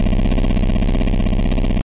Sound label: Machine vibration